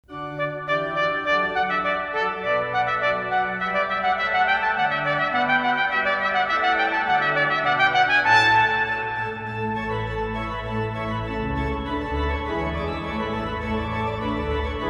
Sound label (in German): trompete orgel